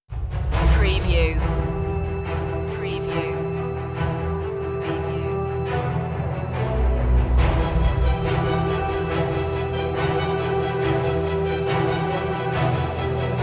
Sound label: apex no choir